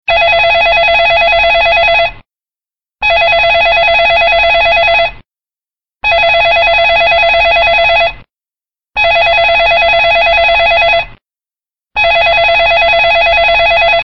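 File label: landline phone ringtone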